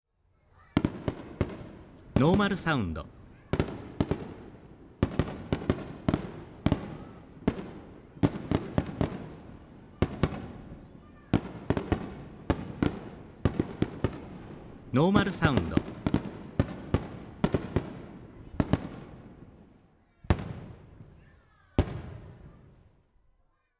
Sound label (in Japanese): hanabi